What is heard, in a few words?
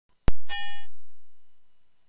high, drum